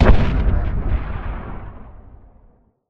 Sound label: rocket explosion far; Titanfall 2